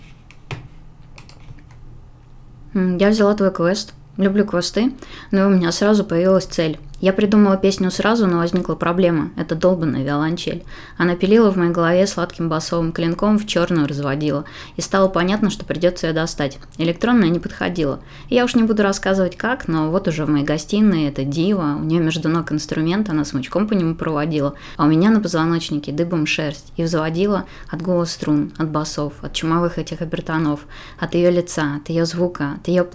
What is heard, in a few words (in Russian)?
5 violonchel